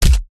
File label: impact thud light squelch